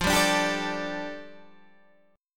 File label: G Suspended 2nd F, Guitar; Gsus2/F strummed; JGuitar